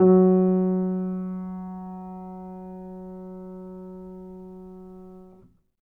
MONO, Acoustic Piano; InVision Interactive; Miroslav Vitous

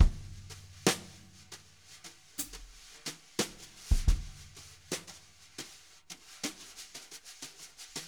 jazz brush loops

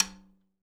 hi tom tap

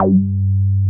Moog G2, analog bass; Music Store - Claudius Diemeter Schwerlos

